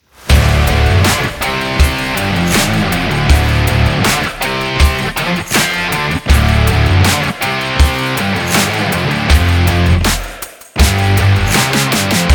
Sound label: groovy rock short